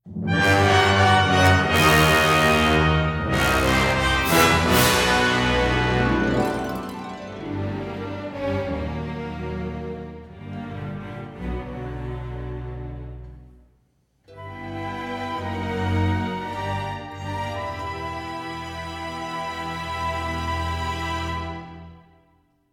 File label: Event Theme Stinger Imperial; Makeb; Star Wars The Old Republic Rise of the Hutt Cartels and Shadow of Revan